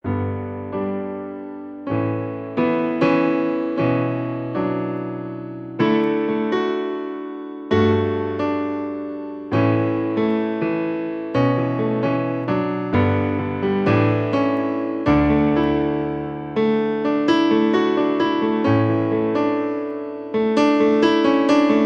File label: love story piano